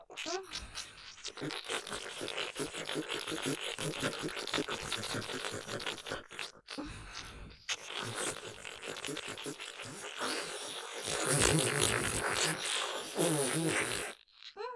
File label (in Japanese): 舐め音◆ねぶるように・前後スライド系◆音のみ; ステレオ; ループで楽しむ！シェフの気まぐれ抜き抜きコース